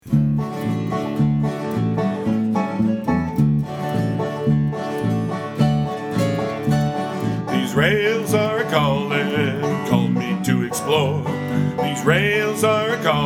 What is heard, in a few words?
train song